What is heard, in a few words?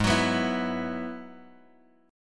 G sharp Minor 7th Sharp 5th G sharp down Bass Standard; G#m7#5 strummed; JGuitar